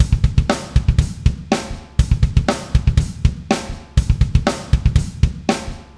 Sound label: drum; childsplay plugins; FindsoundData